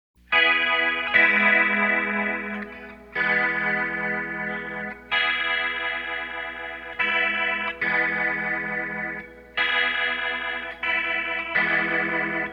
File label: Fast Slow Speed Ranges, Neo Ventilator